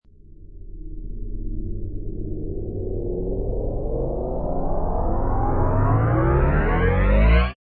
Rising sound for sound design; Low Rise; Rises and Dives

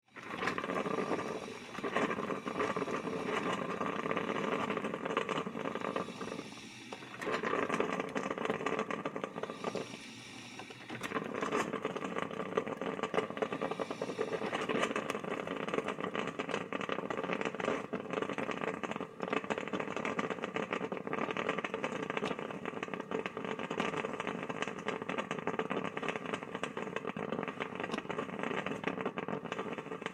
Household Coffee Brew; Appliance Sound Effects; Appliances (Sound Effects:Household); SFX Bible 6

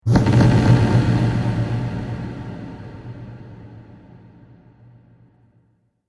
Cinematic Dark Hits; Hit Sound Effects; sound design

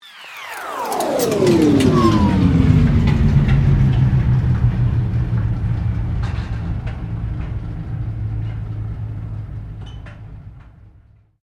Sweep Mechanical Slow

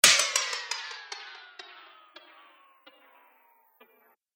Repeat That Hit; Miscellaneous Impact Sound Effects

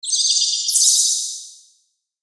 Bird Chirps Reverb Delay; High Tone; Blastwave

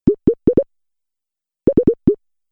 Idea Bubble Pops, cartoon; Cartoon Sound Design

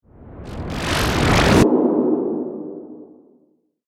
Warp Dimensional, Scifi, Science Fiction; BlastWave

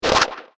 Alien Torture Laser Whip; Laser Sound; science fiction sound effect; Andrew Fleming

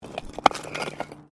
Bricks Movement; Material components for constructing more complex sounds; Rocks; Materials

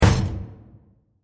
Wood Impact With Metal Particles; Bursts (Sound Effects:Impacts)

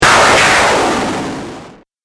Game App Explosion, Video Game Sound Effects

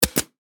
Pneumatic Stapler, Industry, Gun, Fire; Power Tools; BlastWave

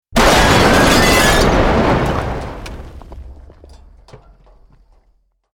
Building Collapse Medium

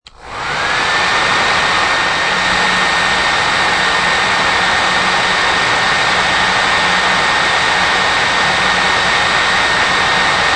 Hairdryer Still, Hair dryer blowing while still.; Bathroom; Household

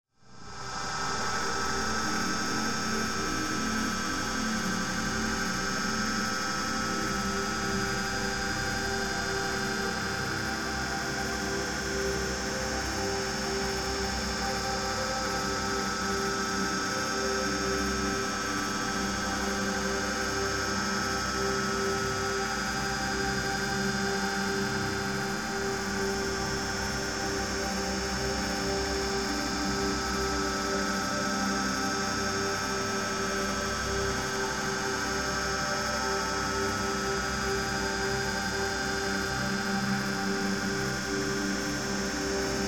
Drone Metallic Flange Static; 5.1 STEREO MIX; Blastwave

